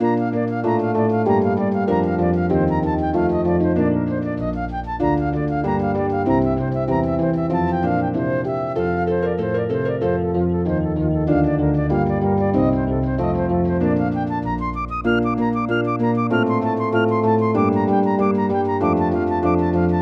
Flute and Harp; Wedding Music for Flute and Harp Vol 1; arrival of the queen of sheba

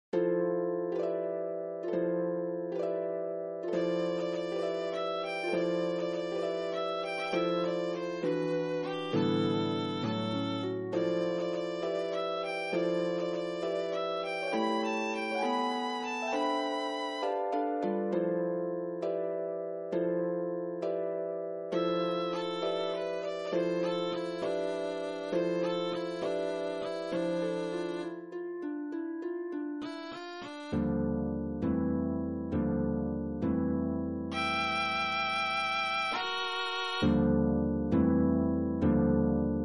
Violin and Harp; Sonatina; Sonatina for Violin and Harp 1999 Movement 2

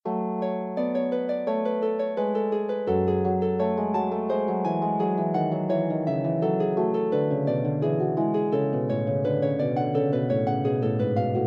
prelude, Harp; Forty Eight Preludes and Fugues Book 1

